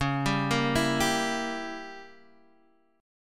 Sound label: C sharp Diminished 7th C sharp